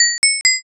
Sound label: pay